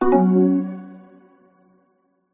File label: multimedia alert short 2 notes mallet synth exclamation; zapsplat